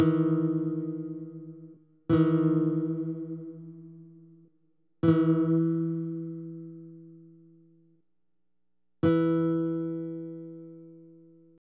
Playing Chords; betrayal at krondor

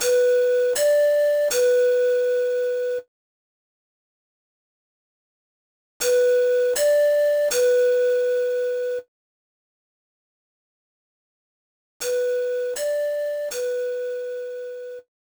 Spirit Flute Practice; the legend of zelda spirit tracks; Healing

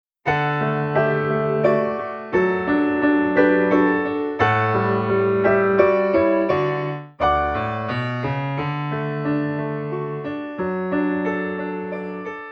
With Chorus Backing Track (Karaoke); Boku no Natsuyasumi 3

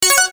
Ding

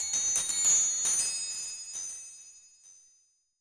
Jig; eigo de tabisuru little charo

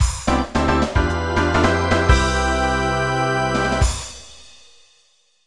2nd-4th Place Fanfare; Mario Kart 64

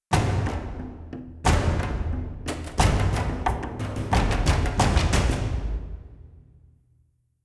Combat In; Skellige

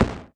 crunchy

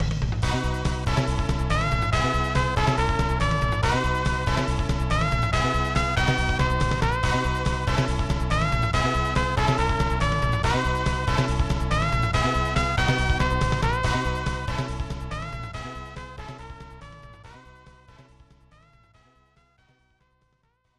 District Tournament Victory; Super Koukou Yakyuu Ikkyuu Nyuukon; SNES

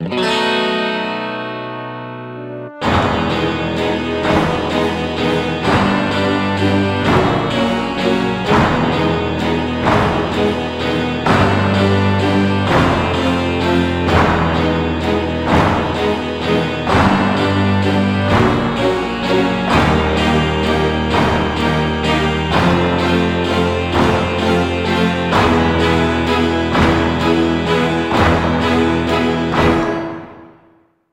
Victory; Thunderhead Victory (Place); Speed Racer The Videogame; PS2; Wii

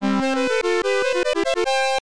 Ocarina; Wonder Boy in Monster World Genesis

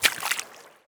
warrior walk water

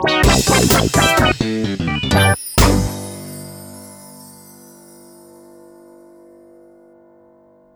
Ending Fanfare; Silly Science; Snipperclips Plus

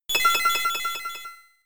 Bell; Heal Bell

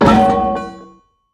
Crash Objects Large Metal; gamerip; Car Simulator 2; android

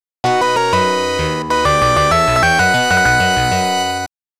Floor Clear Music; the return of ishtar original soundtrack

